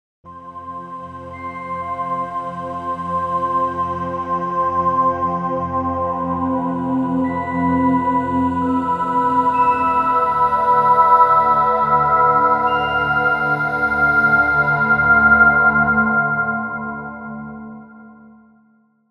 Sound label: Athmosphere